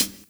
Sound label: Hhat, HiHat; Equipped Music; Loungin House